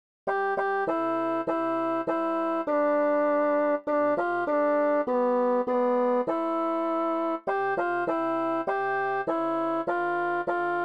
cumpleannos, sin voz

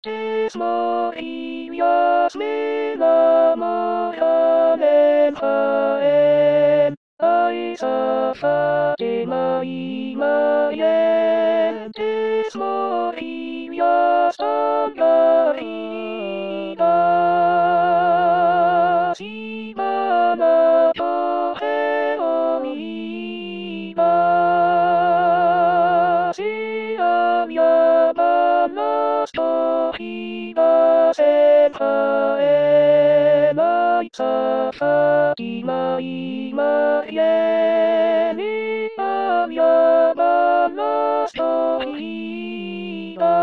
tres morillas A voz, con voz; Coro "Con dos Bemoles"

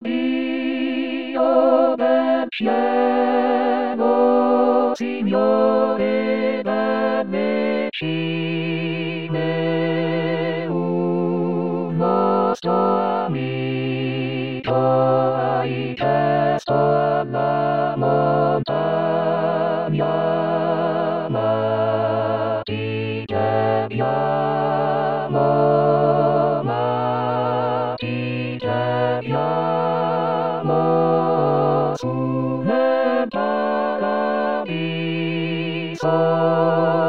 TODOS voz

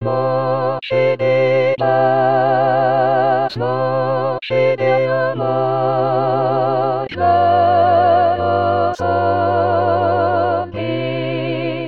TODOS voz; Coro "Con dos Bemoles"; SATB

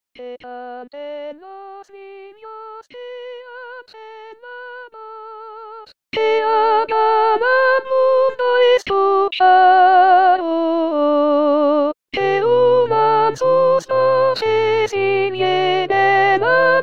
47 canteninos T voz, con voz

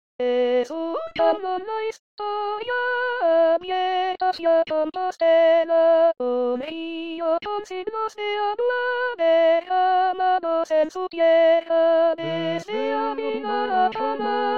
la rioja, voz; Coro "Con dos Bemoles"

